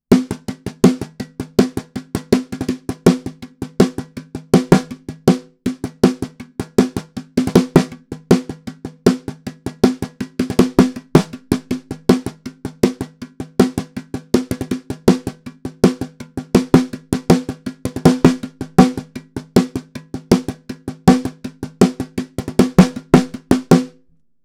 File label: perc intro snare